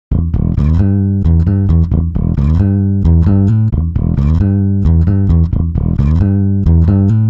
bass; ikm sampletank stretch; timestretch

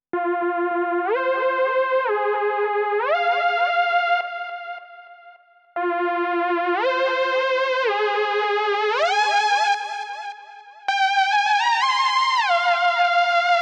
lead porta pressure; Clavia Nord Wave

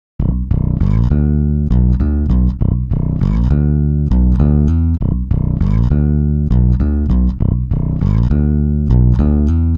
pitchshift bass; digidesign pro tools

